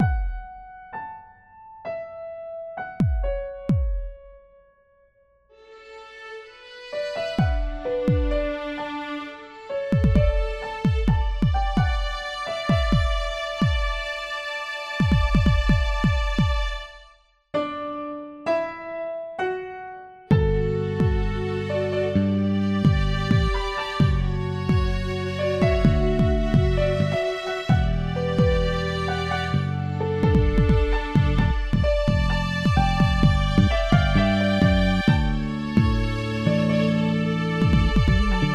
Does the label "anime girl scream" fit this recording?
No